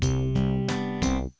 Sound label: Render Cancelled; povray